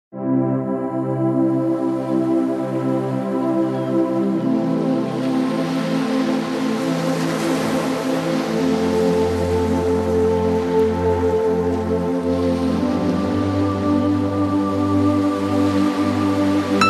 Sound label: piano chill house